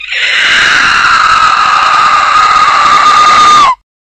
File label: screech slowed down